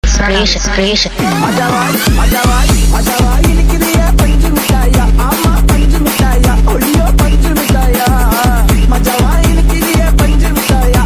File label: remix ringtone; Raayan